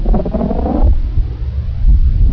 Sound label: mixer